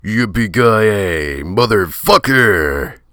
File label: YIPPIE; DN3D; Duke Nukem 3D